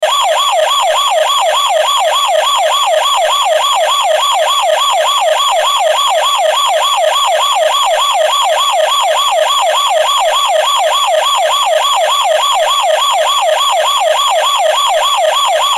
sirene policial de carrinho de brinquedo

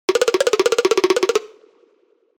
correndo desenho animado

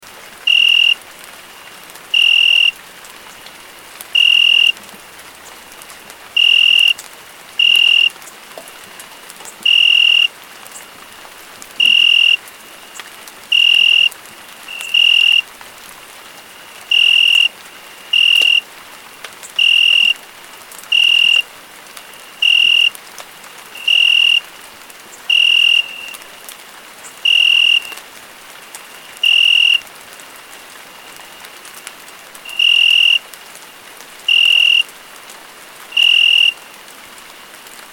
som grilos e chuva efeito sonoro

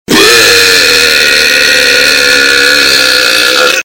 arroto profundo

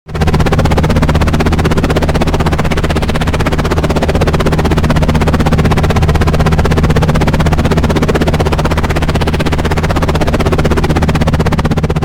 helicoptero pousando

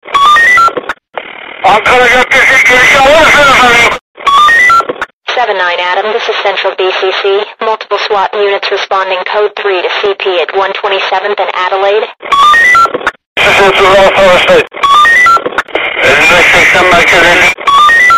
toque para celular do radio da policia

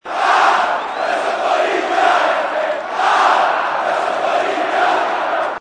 Ah Eu Sou Corinthians, gritos de torcidas

